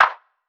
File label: Clap, Drum One Shots; Claps; Cymatics; Eternity Sample Pack